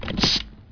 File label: helmet On; Kerbal Space Program; KIS